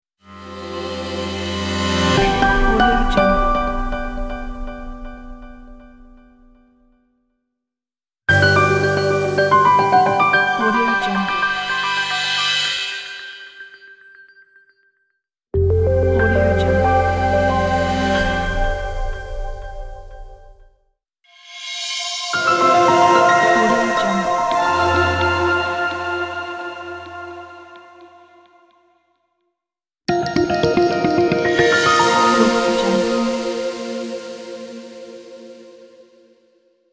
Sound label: simple corporate logo pack